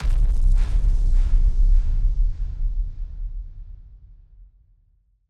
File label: Hit Splosion; musicradar; bomb factory